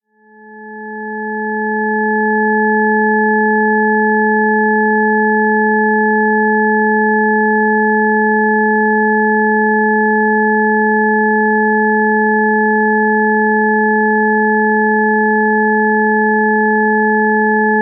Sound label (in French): harmoniques, musique